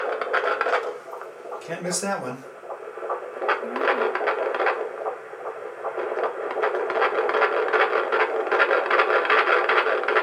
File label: heartbeat